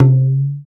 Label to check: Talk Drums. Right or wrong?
Right